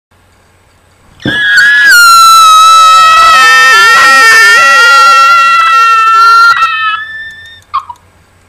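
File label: Sounds scream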